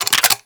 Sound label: Sniper Reload; Warframe; Tenno